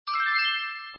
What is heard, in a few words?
minislot key get